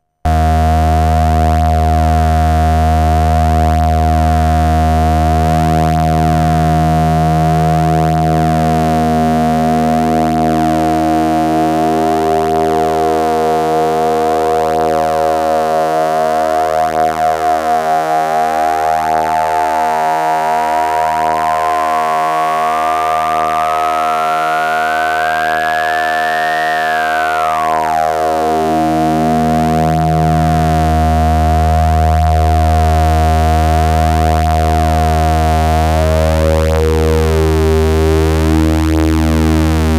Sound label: A8 4 VCOs 12dB HPF